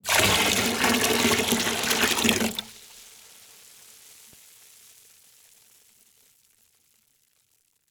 Liquid Water Pouring Into Water In Plastic Bucket Fast